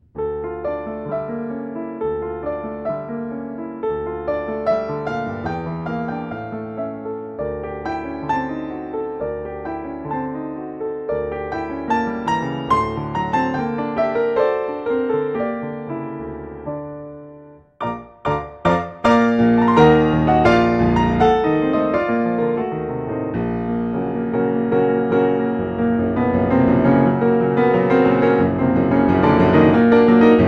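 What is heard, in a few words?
Brahms Rhapsody Grand Piano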